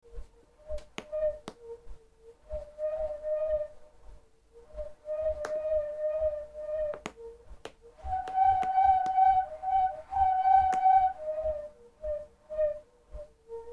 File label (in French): TUBE MUSICAL; LA KERMESSE DU COLLÈGE